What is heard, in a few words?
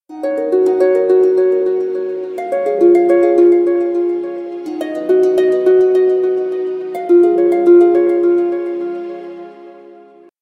harp echo, infinix; telefona infinix